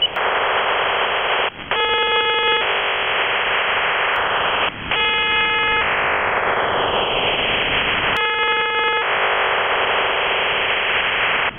OFDM 112 tones burst mode on 14 MHz

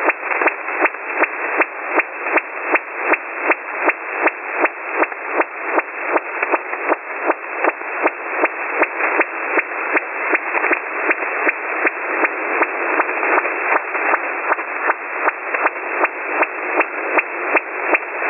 Codar like HF Radar from Far East 2.6 sweeps-sec

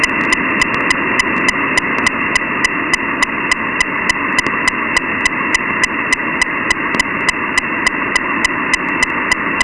Iranian old OTH Radar on 14 and 21 MHz

